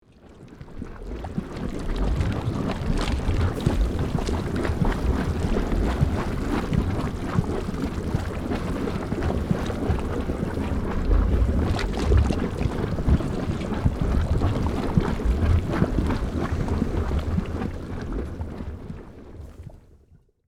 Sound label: Lava lake bubbling magma loop; volcano